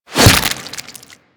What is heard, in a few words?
Desert Skate Barb Hit Flesh F; Warframe; Attacks; Hit Effects